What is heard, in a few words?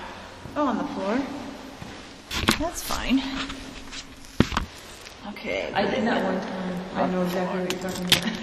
EVP